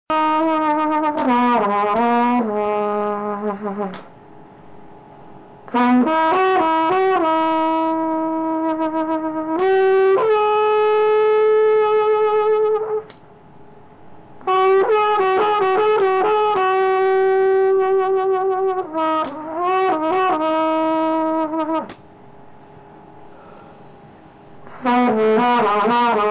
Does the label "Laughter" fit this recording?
No